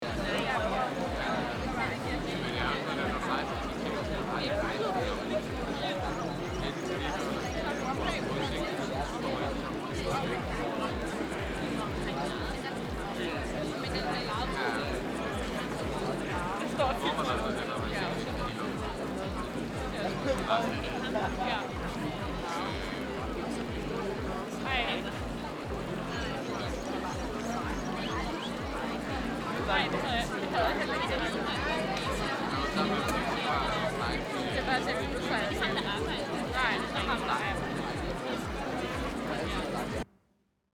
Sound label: Park cafe ambience outdoor chatter